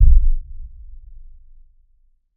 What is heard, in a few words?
Thud With low long reverb